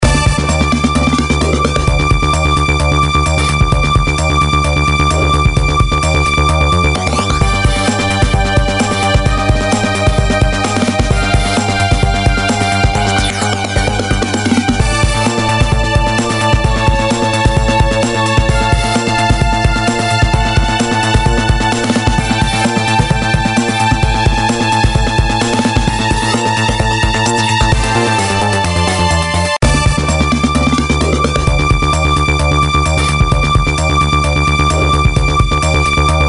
Music; Street Football II; NDS

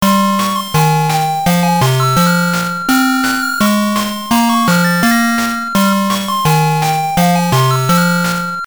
VS Mode Lose; Heiankyo Alien; GB

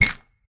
Reward Egg Tap; Angry Birds

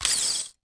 Toy Zing

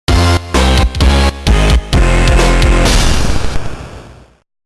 Mini Game Lose; Dragon Ball GT Transformation; GBA

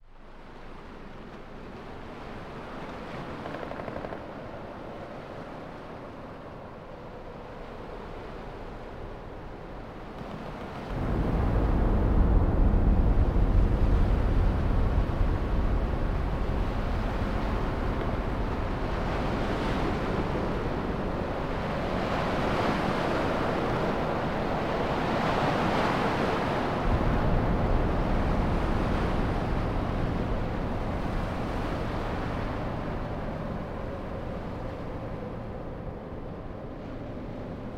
war ambience; Worms 3D

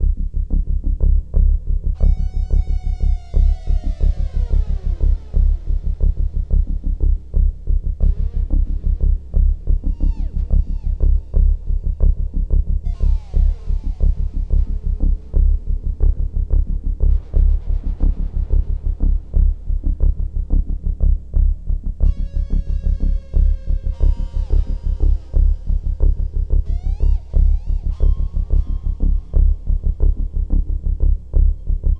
ship tension; alien earth

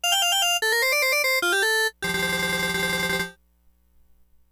Round Clear Music; Pac-Land; PlayStation

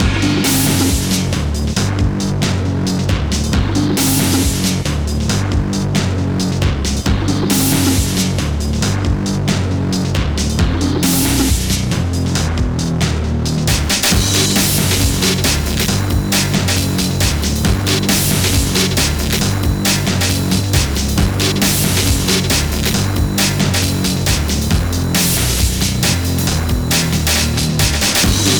Fight

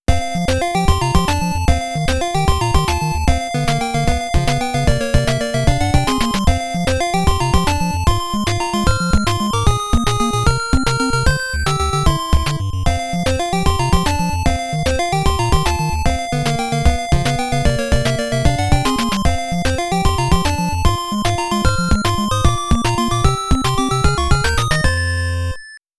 Half Time BGM; konami famicom chronicle vol.1 disk system edition

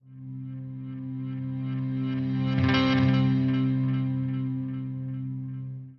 Action Intro Sting; Dead to Rights Retribution

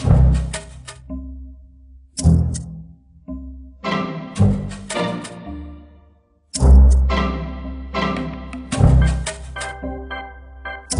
Jungle Level

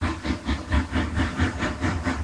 Steam Engine; Learn to Fly 3

